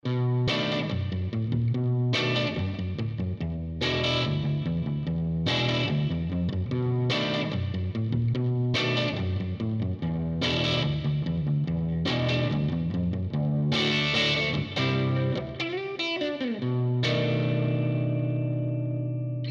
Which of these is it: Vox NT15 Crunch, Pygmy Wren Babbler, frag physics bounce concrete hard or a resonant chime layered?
Vox NT15 Crunch